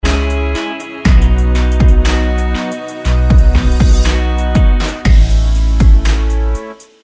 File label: Inst